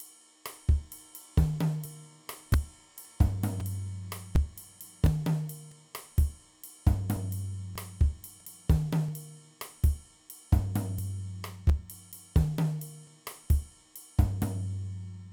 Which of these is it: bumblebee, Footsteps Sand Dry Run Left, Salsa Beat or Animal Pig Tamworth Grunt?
Salsa Beat